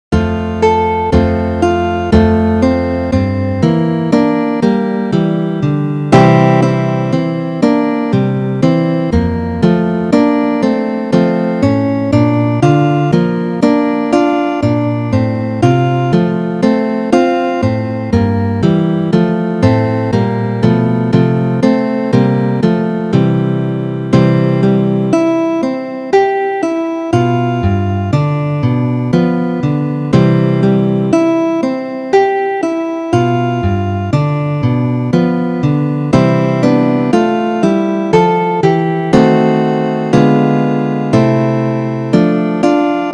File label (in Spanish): metodo guitarra parte duos; Ferdinando Carulli